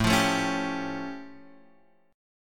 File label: A Augmented 7th A; A+7 Chord {X 0 3 2 2 3} strummed